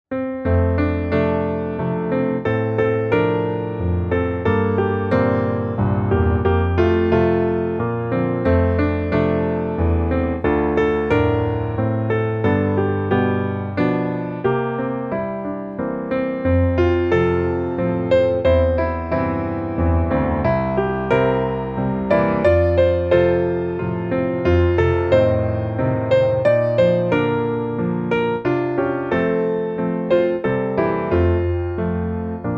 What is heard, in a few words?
piano